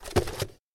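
composite sound effect of cardboard box falling from different height and from different angles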